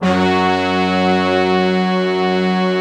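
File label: brass tutti, Brass Tutti octave; Optical Media International - Sonic Images Library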